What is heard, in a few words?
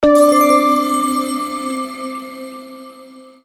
SE SELECT GATE; KALEID